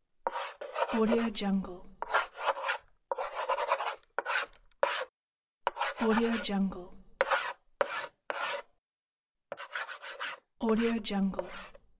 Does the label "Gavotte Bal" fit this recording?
No